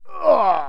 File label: Pain, soldier; hellenes